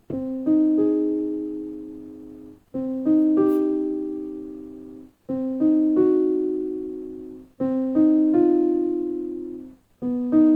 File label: noodling